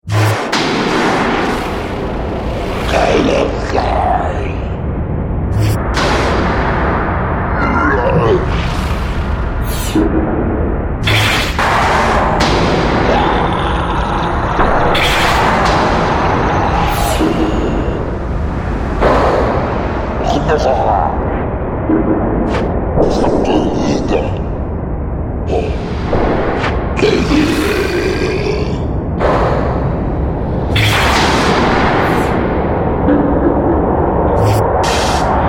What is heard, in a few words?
Monster Battlescape; Monster Sound Effects